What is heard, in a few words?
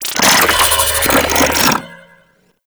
Droid Array Reload, weapons; Warframe; Cephalon